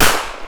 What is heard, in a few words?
380 gunshot single; GMTKGameJam2020